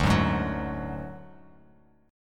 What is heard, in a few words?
D Major Flat 5th D Bass Standard, chord